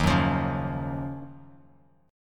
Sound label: Eb Minor 9th Eb Bass Standard; Ebm9; strummed; JGuitar